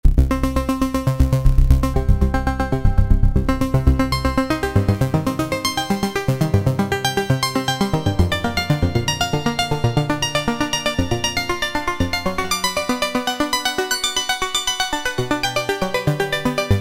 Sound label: Korg microKORG Elektric Arpeg.; Factory Voices